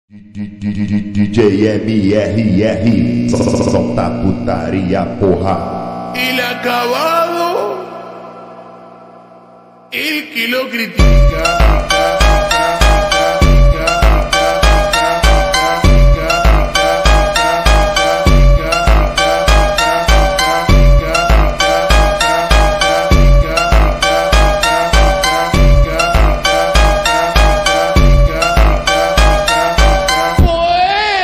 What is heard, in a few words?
funk slowed reverb